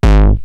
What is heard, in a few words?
Roland TR-909 BD; BD Selection